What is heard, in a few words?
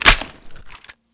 pkm cover smack; weapons